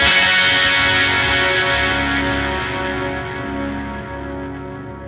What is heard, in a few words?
Lake Dirty F sharp; torchlight iii; ps4